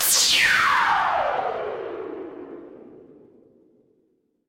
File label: magic scifi laser